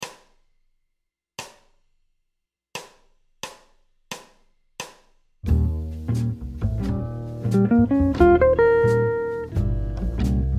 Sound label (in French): Playback, guitare jazz